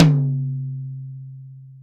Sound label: tom, velocity tom; VELOCITY TOM 1